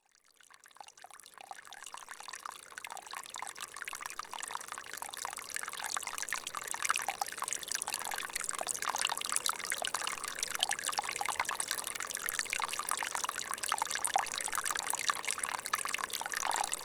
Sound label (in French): Ruisseau 1700m